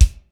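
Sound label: NAT BD, natural bass drum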